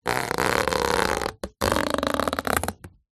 foley balloon long un inflated pull stretch

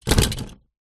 leisure toy cars drop into cardboard box

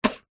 human spit; zapsplat

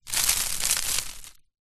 wood chippings small drop sprinkle onto ground; foley

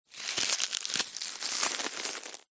foley leaf large dry crunch crush break; zapsplat

